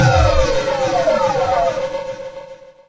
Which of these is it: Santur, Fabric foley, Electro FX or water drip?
Electro FX